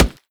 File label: cardboard, bullet impacts; physics; Half Life Model Pack